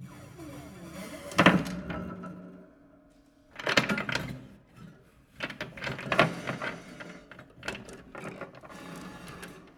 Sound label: Marble Roll Rattle, Piano